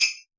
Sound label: hip hop bells